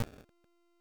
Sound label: Short Sword Medium Parry Perfect Block Impact